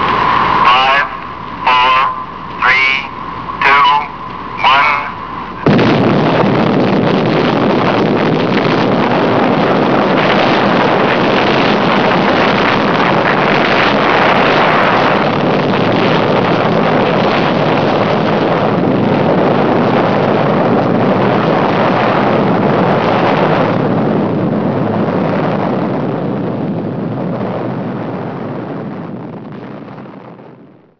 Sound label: Nuke Detonation; Fallout